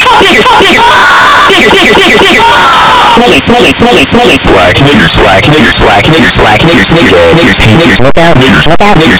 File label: supa beat